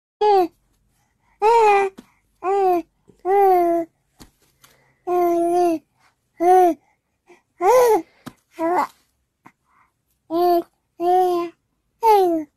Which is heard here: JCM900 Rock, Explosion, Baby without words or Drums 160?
Baby without words